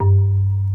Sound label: 808s